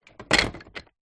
leisure toy train wooden track piece place down on others in plastic box; zapsplat